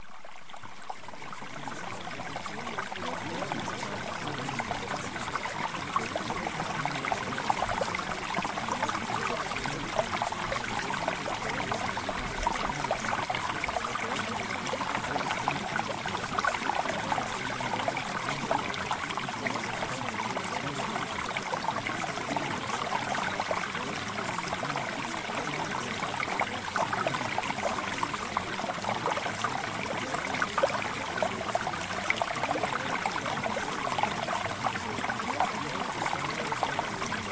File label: Mountain Stream